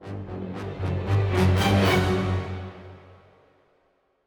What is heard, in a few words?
Cinematic Music - Sinister Stinger